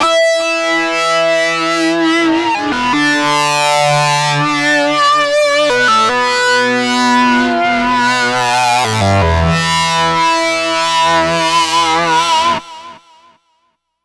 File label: Feedback Lead; Riffs & Solos; Dr. Fink's Funk Factory